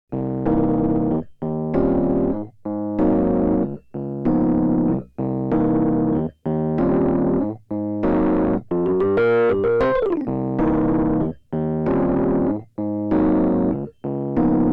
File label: Rhodes harm